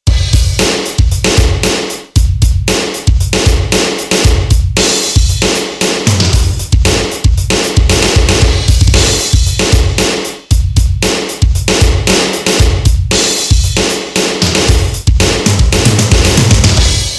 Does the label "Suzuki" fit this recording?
No